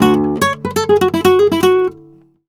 Flamenco Guitar Picado, dm; Best Service ProSamples vol.46 - Flamenco

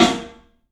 SNAR, 3-D Percussives; E-MU Producer Series Vol. 5 – 3-D Audio Collection; P.A. Kit

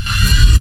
INST FX, Ragga & Dub Reggae; USB Soundscan vol.27; AKAI

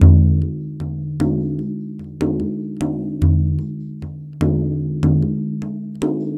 Frame Drum, Ethnic Percussion; Sonic Foundry (Sony Creative Software); Ambient Atmospheres & Rhythms

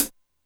Cym Hard Hat, Drum Machines Alesis D4

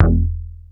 Ind Bass (E), Synth Bass One Shots

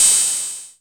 SYNTH OP.HH, DANCE KIT

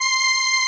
SOLINA, String Machines

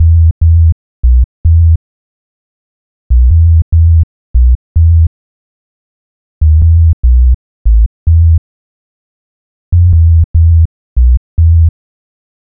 Low Bass; Sonic Foundry (Sony Creative Software); Club Joints and Street Anthems

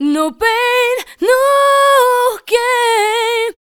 VOC F, Dance Vocals, FEMALE; Best Service ProSamples vol.12; AKAI

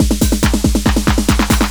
140 trance break, Drum & Perc Loops; Trance Explosion Vol1

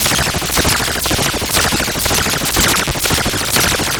LFO, Noise

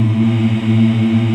African Mm m; Roland LCDP11 Africa VOL-1

